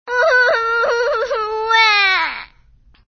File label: crying; Dora; Nick Jr. Take Care of Baby